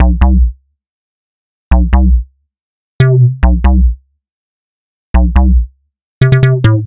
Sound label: Norm Bass; Dubstep